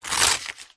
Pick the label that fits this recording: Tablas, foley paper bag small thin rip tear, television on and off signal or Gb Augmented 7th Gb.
foley paper bag small thin rip tear